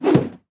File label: Tnt Box Popup